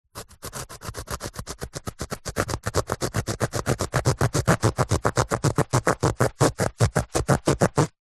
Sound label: paper tissue cutting with serated knife; foley